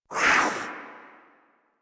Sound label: Kavat Vocal Attack Short; Warframe; Khora